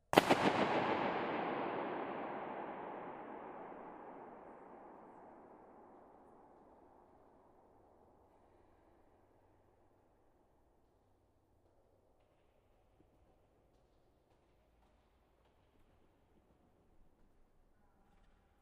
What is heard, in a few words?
balloon burst at edge of dome with mic on opposite side whispering gallery; Trevor Cox; SoundBook